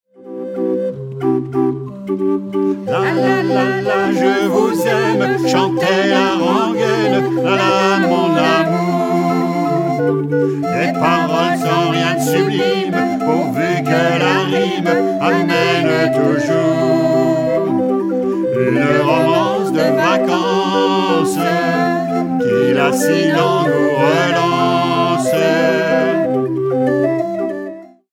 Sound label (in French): petites notes de musique